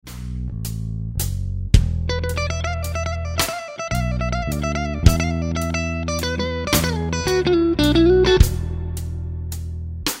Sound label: Lick, guitar; Slow Minor Lick 2; Minor Blues licks